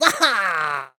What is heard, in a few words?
Water Splasher; rabbids multiverse; iOS, macOS, Mobile